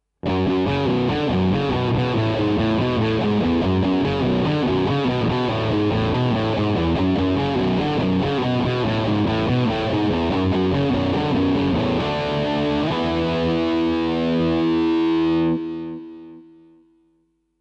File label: riff